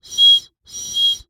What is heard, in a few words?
Birdwhistle, clay, painted, dry, airy, wispy, double, swells, pulse; Eiravaein Works; Vaeyan IV